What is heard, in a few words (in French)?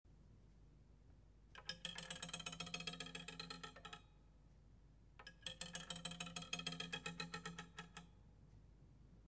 metal, tricot, touchers de la harpe